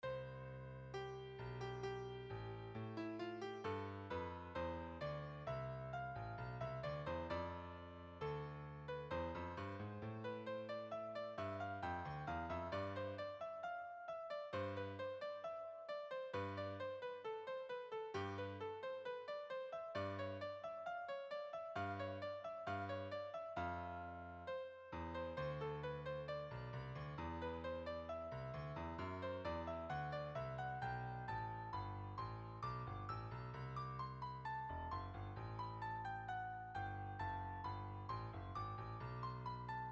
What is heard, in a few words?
Melody Bass